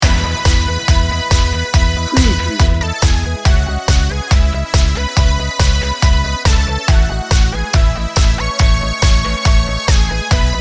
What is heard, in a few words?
positive direction loop